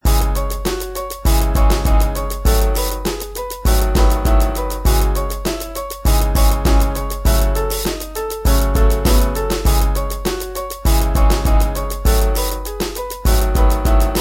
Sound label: E piano